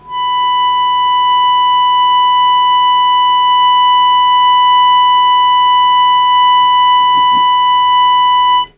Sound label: Long Sustain Aa B2, Harmonica; Zero G - Harmonica